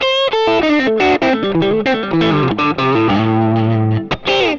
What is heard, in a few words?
bassement plague lick, distortion